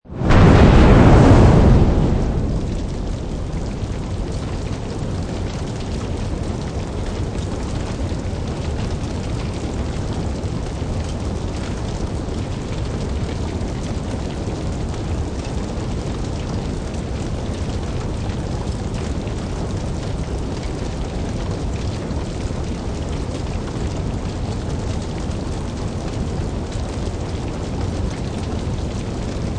Explosion Fireball Flames Fire, A large fire whoosh bursts starts a fire in a building.; Fire starts in a flame burst and then burns evenly for abou